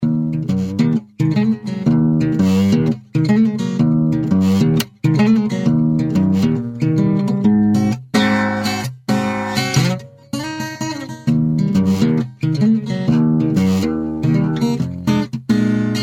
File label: guitar